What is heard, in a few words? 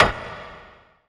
movie, impacts & hits; Techno Trance Essentials